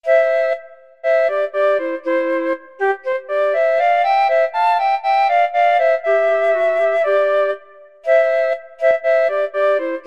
flute clarinet duet

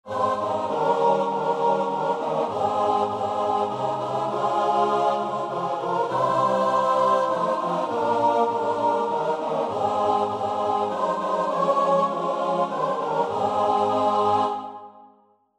jesus calls us choir

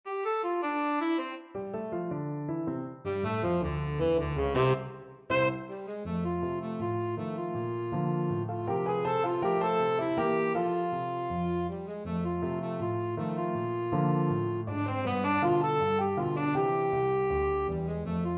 the entertainer, tenor saxophone

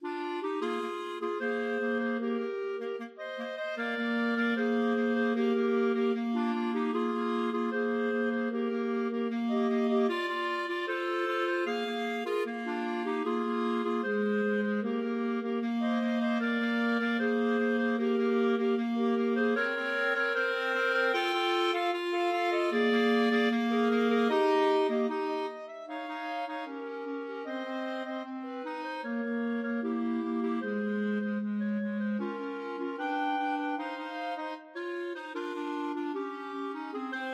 clarinet trio

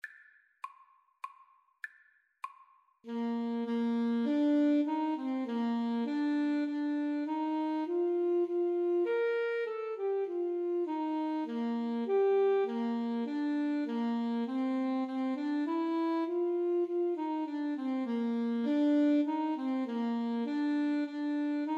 clarinet saxophone duet

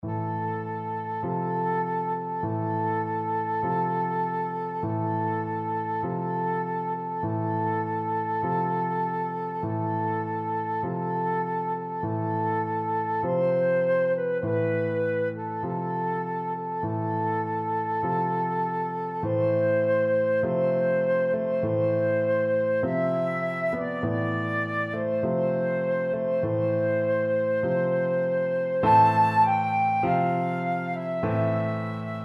chopin funeral march flute

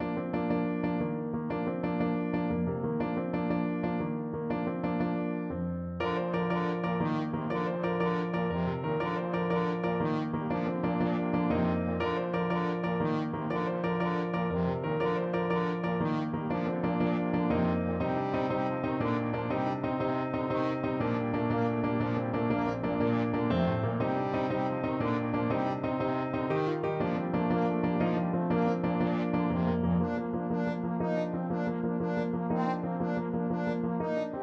trombone

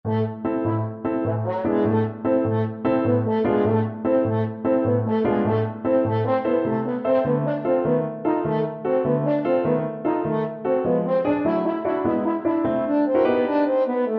french horn

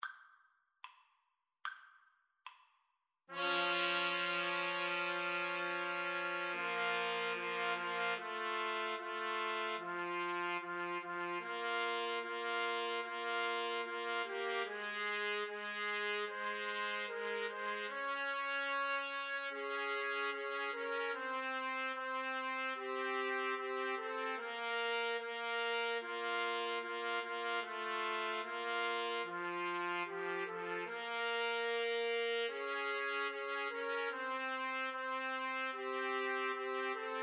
beethoven 7 2nd, Trumpet Trio

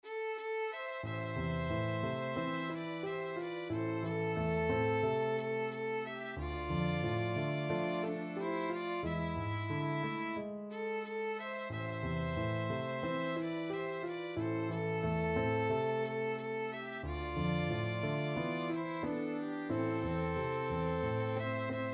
wayfaring stranger, violin duet

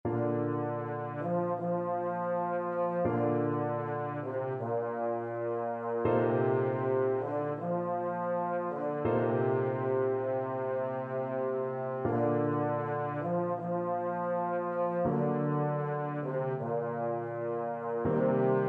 largo, euphonium

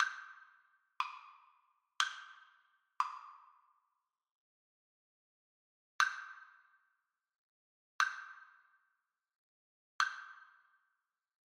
trombone trio

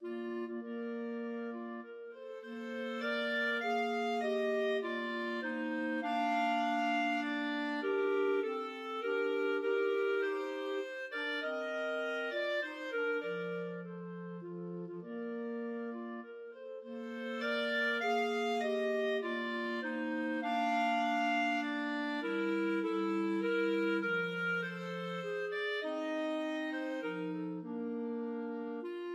nocturne, clarinet trio

